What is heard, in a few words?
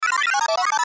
button tape rewind; Multimedia; Buttons; Blastwave